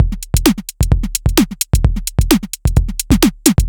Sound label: Synth Loop, BPM130; AKAI S6000 CD-ROM - Volume 4